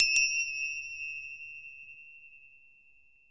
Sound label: KETJER, ETH PERC; WorldSounds